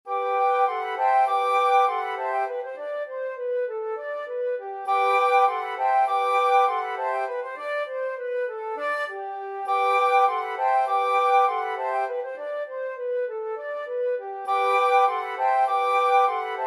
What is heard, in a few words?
flute quartet